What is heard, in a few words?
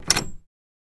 Door Knob Grab; door knobs